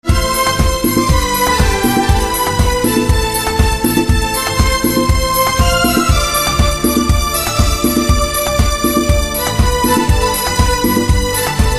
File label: Synth